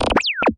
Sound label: Multiple ray gun blasts. Zap, Ray Gun